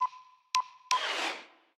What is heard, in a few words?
Defeat CellPhone; smite; izanami